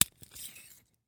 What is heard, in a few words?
Needle Handle; Medical; Blastwave